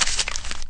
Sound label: cash; kingpin